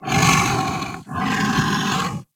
Feral Vocal Breath Walk; Warframe; Kubrow

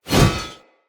Whoosh, Swords

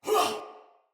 Pain, Soldier Female; Battle; Barks; Dialog; Sinecure Soldier Female A

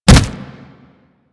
Generic Hit; Warframe; Characters; Tenno

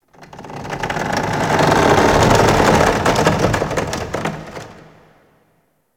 Ice Metal Creak; Warframe; Corpus Ice Planet; Ambience

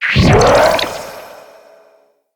Magician Ability Cast; Warframes

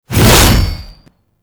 Boss Strike Leap Whoosh; Enemies; Abilities; Warframe; Oraxia

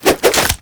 Nunchuck Quick Melee Return; Warframe; Infested

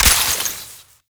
Ammo Pickup Toxin; Ammo Ability; Warframe; Warframes; Frumentarius

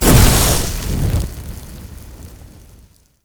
Fire Torch Burst; Ambience; Props; Warframe; Tau; Circles Of Hell

